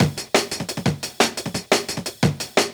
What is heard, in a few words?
175 D&B Drums